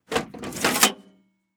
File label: SFX case Remove Metal; PC Building Simulator